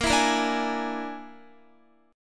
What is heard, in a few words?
Bb 7th Flat 5th Bb down Guitar; 4E,4A,5D,5G,5C,6F; Bb7b5 strummed; JGuitar